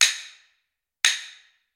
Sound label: Hyoshigi fire; jidaigeki